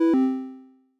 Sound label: KB Noise Off Quiet; TaSTT